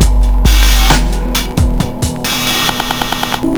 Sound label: Slomo 67 bpm, breaks; Zero-G Beneath the Planet of the Breaks